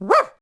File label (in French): chien